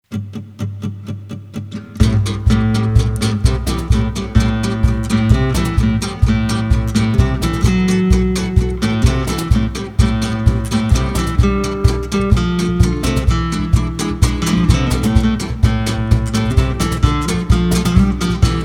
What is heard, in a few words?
smoke and swing; cdhoboes favorites collection vol12